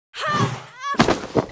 Mob die; Bossmob; 욱일승천류; 히야미; 2단계

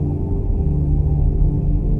Elixir run

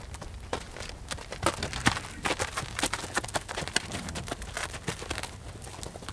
wagon; EnvSound

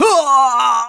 Die

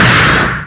onslaught attack; smashing

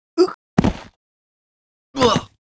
Mob die; Monster; 욱일승천류; 술사무라이

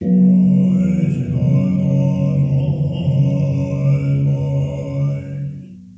monk; EnvSound

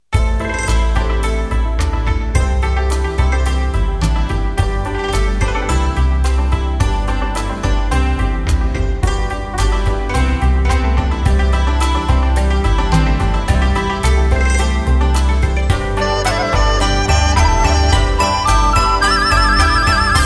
bgm wedding ending

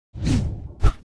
skill shot ready

